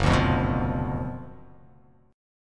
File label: F sharp Major 7th Suspended 4th Sharp 5th F sharp; chordshape; down